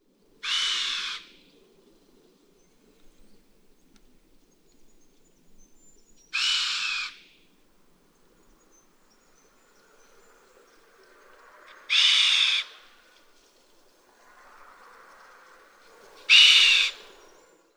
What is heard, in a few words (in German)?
Gams Rupicapra rupicapra pfeifen